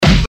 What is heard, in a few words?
fist body hit